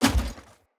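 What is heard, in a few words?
Heavy Grunt Boot Walk Vegetation, Footsteps; Enemy; Sinecure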